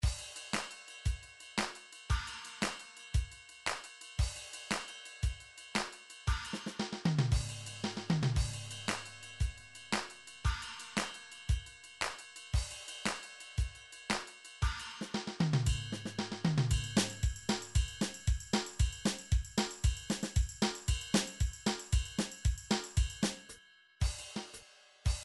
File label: drum midi files kasap